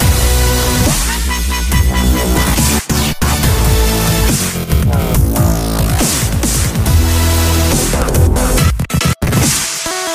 Water Sound Dubstep

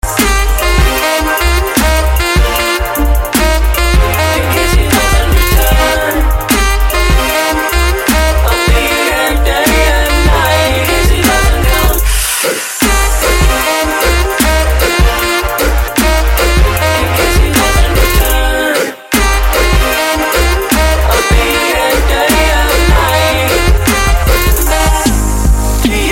Sax

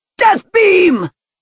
Death Beam; rieza

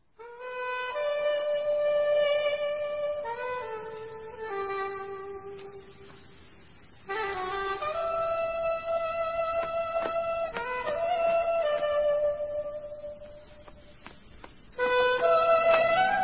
sax

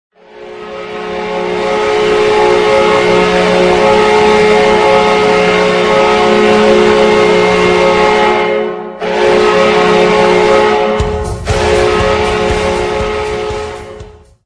minnesota wild goal horn

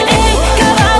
kaval sms

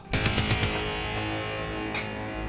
ominous

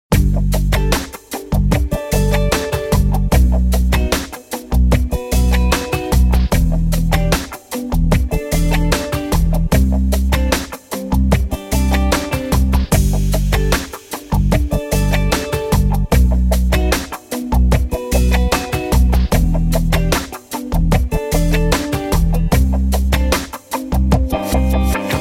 Relaxing song

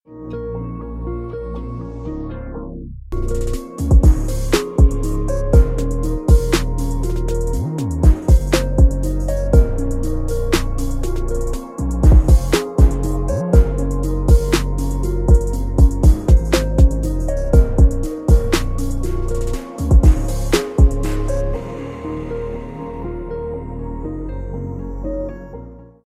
Chill and Lax Hip Hop

